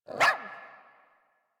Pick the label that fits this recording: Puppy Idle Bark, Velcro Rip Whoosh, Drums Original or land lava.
Puppy Idle Bark